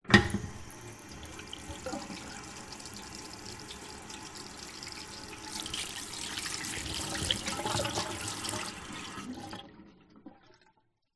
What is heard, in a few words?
public places public toilet tap faucet press on wash hands off